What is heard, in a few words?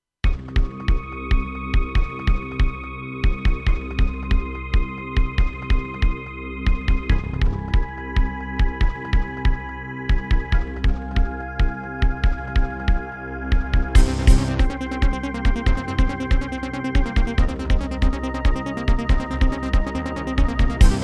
Ensoniq VIVO 90DB-A; sigil doom episode 5